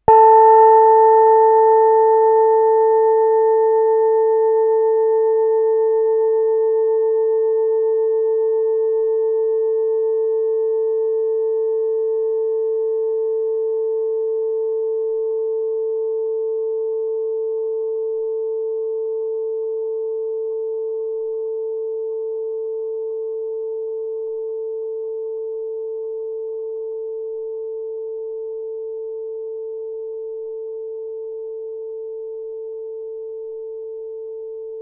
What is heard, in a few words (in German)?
GONG OBLONG; Venus